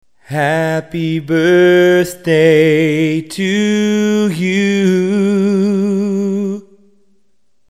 Note Bend Down in a Song

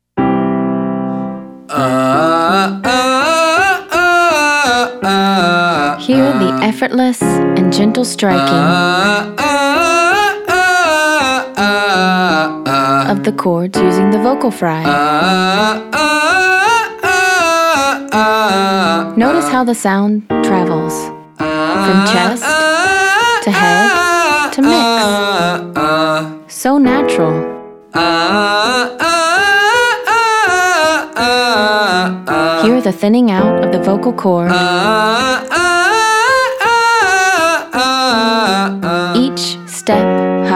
Exercise 4 males; singing lesson

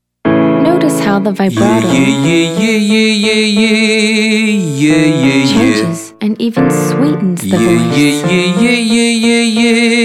using vibrato male

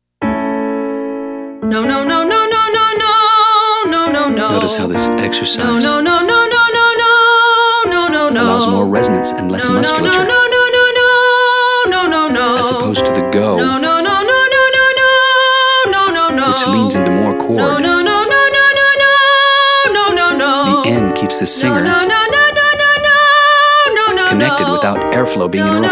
Exercise 6 no no no females; Singing

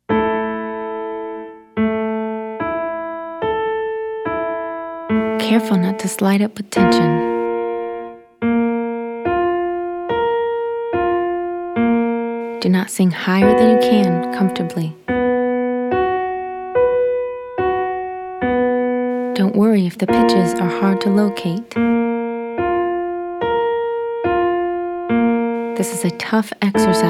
Exercise Your turn Male

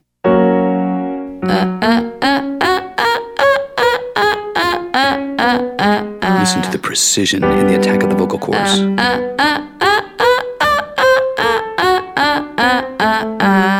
Exercise Staccato female; Singing

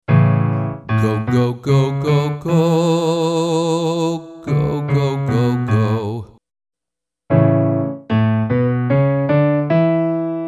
Edgy Vibrato on GO; Singing

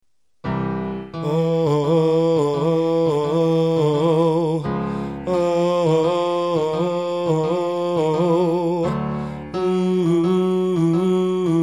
Exercise Note Bend Going Down

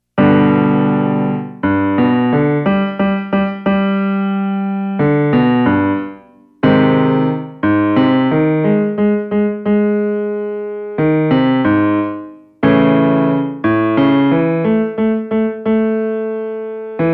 Exercise 7 Men; singing lesson

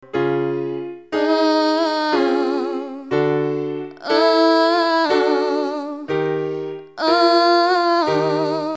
Exercise 3 with fall off

